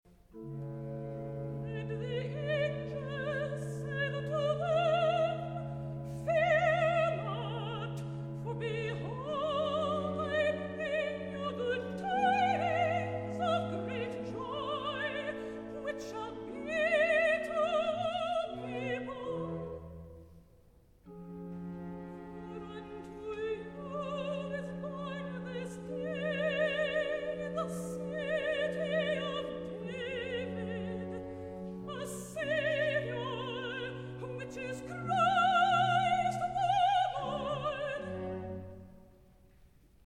concert choir recitative for soprano; and the angel said unto them

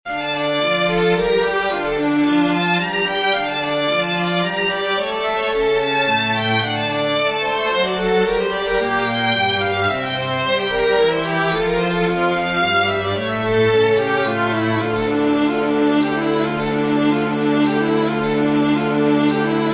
aria, oboe strings; Orpheus could lead the savage race

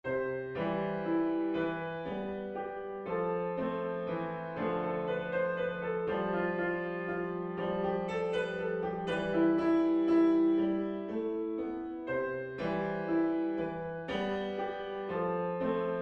waltz; puccini giacomo; musette's waltz

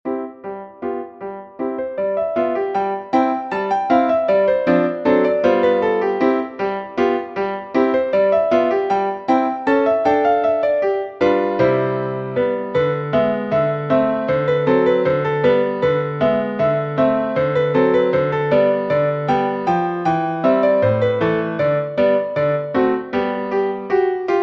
froher sinn cheerful

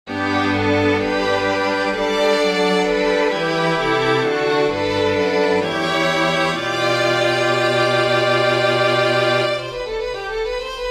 for string quartet; bach johann sebastian; prelude herr jesu christ dich uns wend

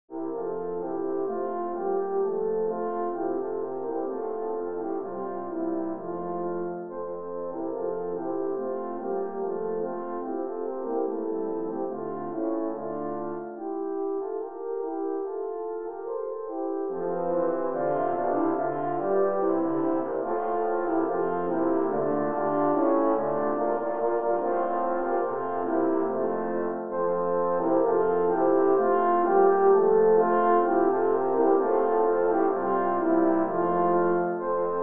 deck the hall

